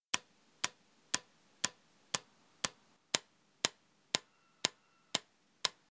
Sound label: K5 vs K7 shutter only